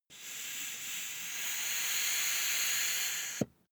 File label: air pump ball